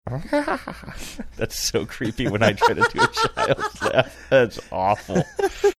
giggling funny saying that's so creepy

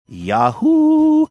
yodeling yahoo

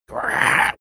mimicking growl; bilbos growl at frodo

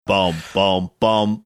singing bom bom bom

